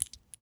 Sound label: raging bull jiggle